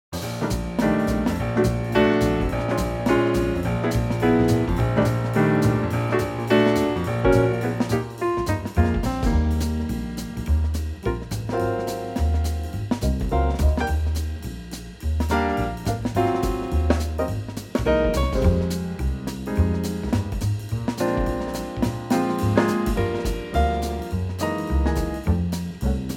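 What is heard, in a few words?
E flat trio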